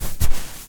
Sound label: footstep sand walk; ProSoundCollection v1 3 MONO